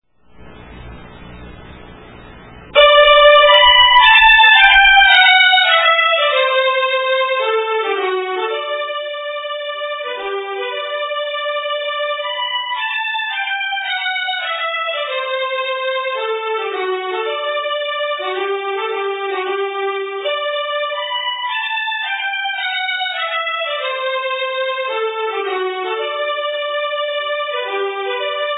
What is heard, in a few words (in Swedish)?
Schottis